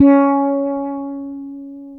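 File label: C#5, warm fretless, bass; Spectrasonics; Bass Legends CD 1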